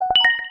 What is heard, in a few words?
Little Notification